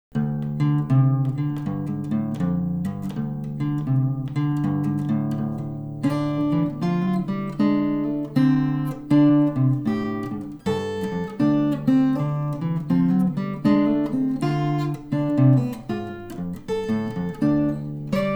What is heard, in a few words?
blues; tucson